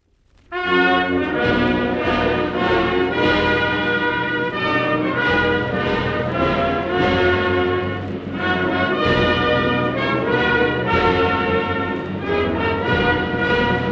star spangled banner national anthem, Marines band